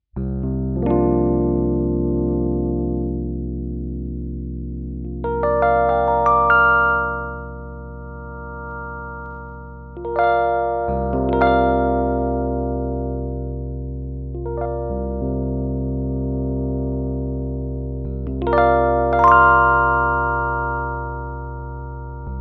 EQ Treble; Ferro

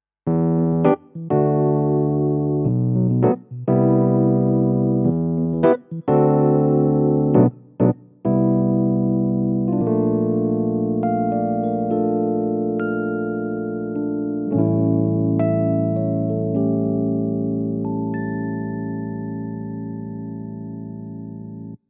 Kawai MP7SE Classic E Piano

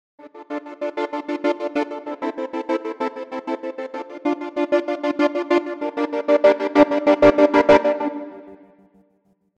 Violin Section Gate; NOVO

